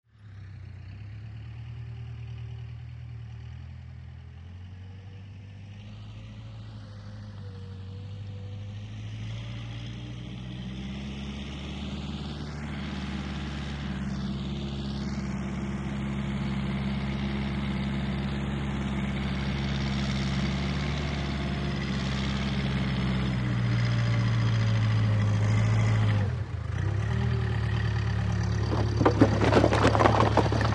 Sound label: 750 Dozer Beeps, john deere 750